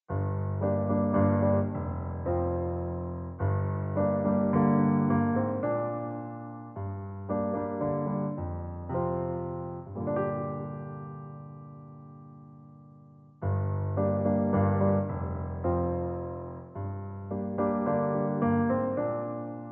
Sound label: Piano Bikalam